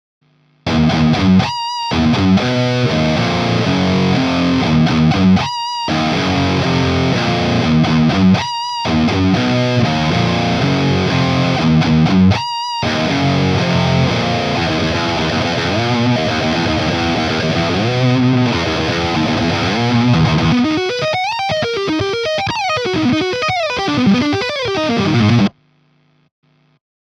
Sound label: VINTAGE Real